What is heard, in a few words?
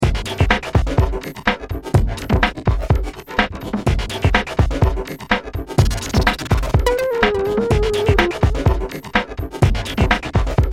techno